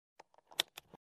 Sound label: Lock Click